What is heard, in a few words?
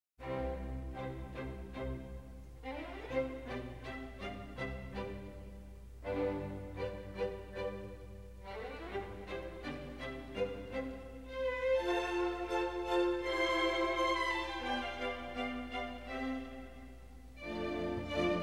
Concerto for Piano & Orchestra in C Major; Beethoven; Concerto No. 1; Op. 15